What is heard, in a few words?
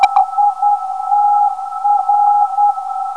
390kHz, NDB; ITR